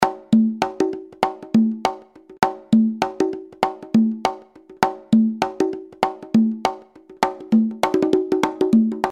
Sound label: BONGOS